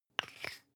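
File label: toothpaste close twist hard, Bathroom; haus-garten; badezimmer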